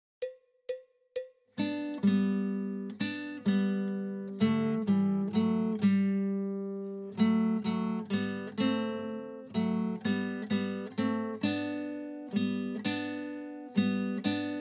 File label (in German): Kuckuck Kuckuck rufts aus dem Wald